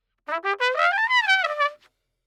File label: Trumpet